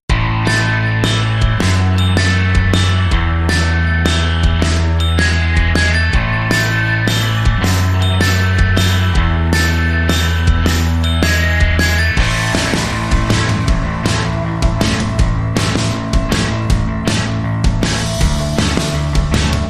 Indie Spark, No Lead Synth

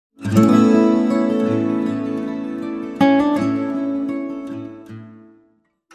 Link Sting

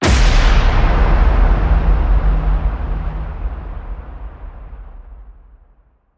hit big grind

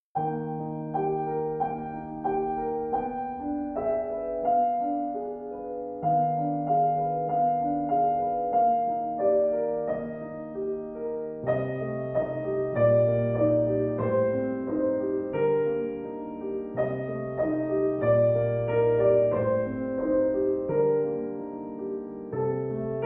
Arietta Op12 No1; Edvard Grieg (PD)

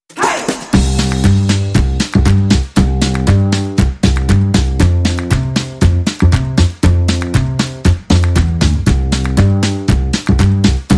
Rhythm and Bass Mix

